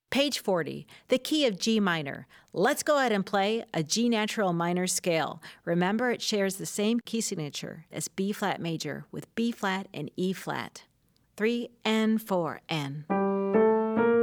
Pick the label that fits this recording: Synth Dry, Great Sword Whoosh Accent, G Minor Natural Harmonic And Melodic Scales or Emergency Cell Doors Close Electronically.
G Minor Natural Harmonic And Melodic Scales